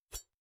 woosh

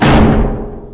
Metal empty hit; Nickelodeon Super Brawl Universe; Android

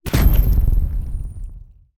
Plasm Bomb

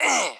Citizen dwarf damage

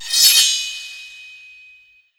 Skill Sword; Berserk

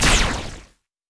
Lightning

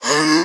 Shield Prepare; Pecheneg

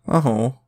Chorus really sad

